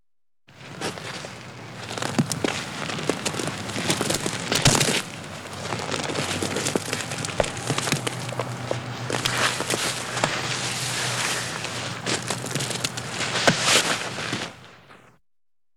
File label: horse, pulling log; JERRY APPS WINTER